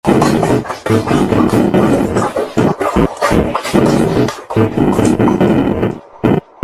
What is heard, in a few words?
phase 1; Garry's Mod; sprunki bailey